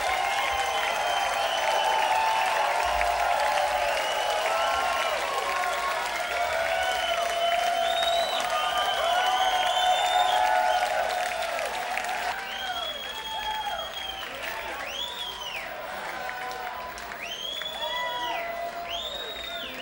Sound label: Crowd; Charlotte, NC; 1313 Club